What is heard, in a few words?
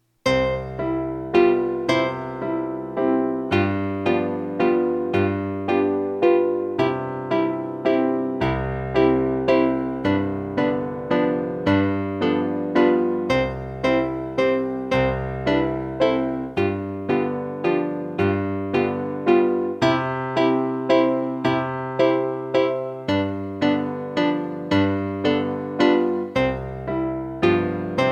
chopsticks